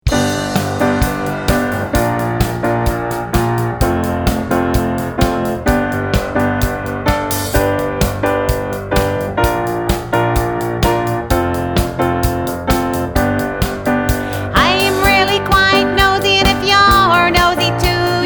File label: nN Song Nose Sound with Lips Open